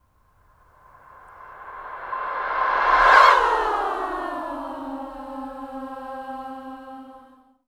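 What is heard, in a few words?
female swoop reverse, voices; SWOOPING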